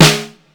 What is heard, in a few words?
Zee Snare, Ensoniq ASR-X Pro